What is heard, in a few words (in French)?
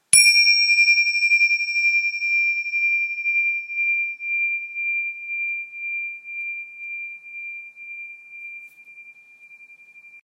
Cymbales 7,5cm plain